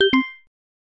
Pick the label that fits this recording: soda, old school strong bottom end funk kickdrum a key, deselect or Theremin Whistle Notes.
deselect